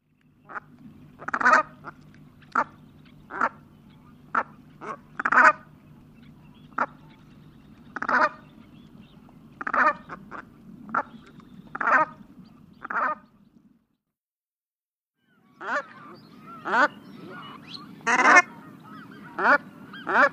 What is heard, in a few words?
Brant